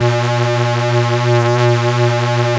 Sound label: PWM A#3, Korg PS3100; Back In Time Records; Korg Universe VOL-1